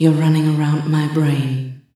soft hit finish; osu!

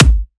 normal hit normal; osu!

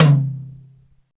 drum hit clap; osu!

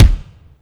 soft hitwhistle; osu!

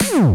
normal hit whistle; osu!

